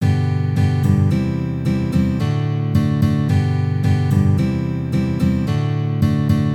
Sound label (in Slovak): nacvik zakl akorov kadenci v rytmoch G dur fox